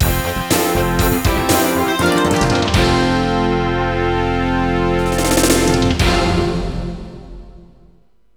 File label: JINGLE